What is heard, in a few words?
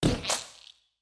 icecream splat